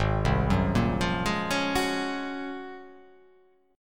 Ab 13th Ab down, guitar, Ab13 chord; arpeggiated; JGuitar

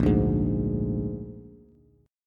Bb 7th Bb down Bass; strummed; JGuitar

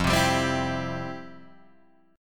F Major 7th Suspended 2nd, Guitar, Drop D

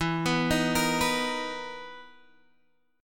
B Major 7th E; arpeggiated

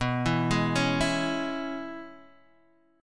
Db Minor 7th B, chord

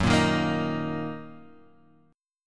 D sharp Minor F sharp

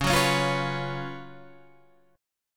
F sharp Major 7th Suspended 4th, C sharp, chord

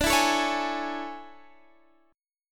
A Major 7th D; AM7/D; strummed

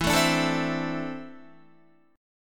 E 7th Suspended 4th Sharp 5th, Guitar; E7sus4#5 strummed; 11,12,14,14,12,12; JGuitar

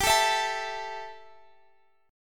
E Minor Double Flat 5th G, guitar

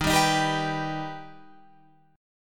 Eb Augmented, Guitar Open F; strummed; 15,14,15,14,14,14

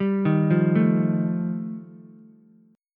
G 7th Suspended 2nd Sharp 5th G chord, Ukulele; arpeggiated; JGuitar

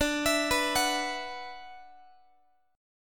D 6th Add 9th D, Guitar; D6add9 arpeggiated; x,22,19,21,22,x; tuning 4A,4E,5A,5D,5G#,6C#

